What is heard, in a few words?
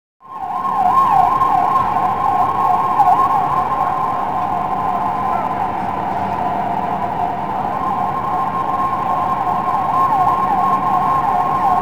Siren US; ambience; Project Gotham Racing 2